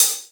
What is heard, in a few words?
dry pedal hi hat sample g key